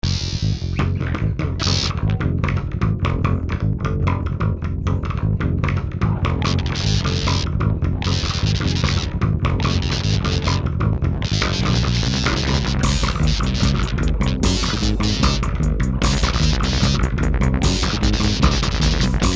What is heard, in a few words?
Bass